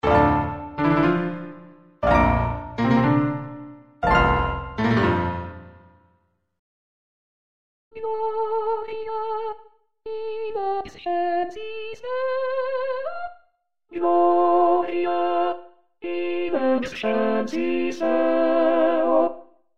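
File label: Gloria Tenor; Rossini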